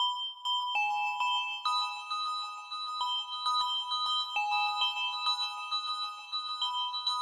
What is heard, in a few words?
space arp techno 133 lead high E; instruments; loops